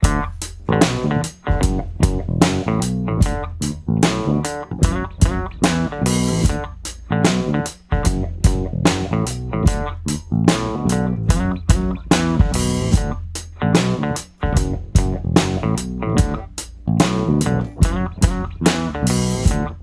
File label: FUNK